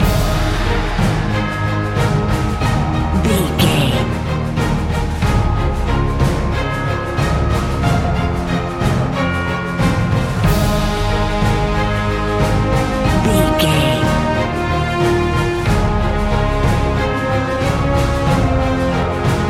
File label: cinematic corporate loop